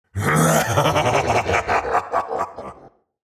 Demon king lord cackle

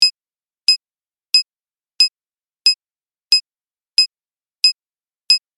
Bomb digital beeping timer; Explosions

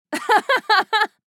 Young woman laughing

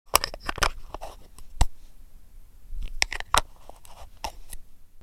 Professional camera replacing the lens

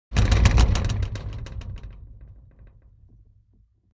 Metal blind frame shake rattle; blinds

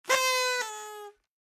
Party horn

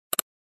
Computer mouse single click

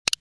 Futuristic button

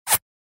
Bullet pass by

